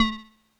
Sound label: Drops Kawaii A2; keys